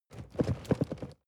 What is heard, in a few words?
cardboard box medium shake soft short; objekte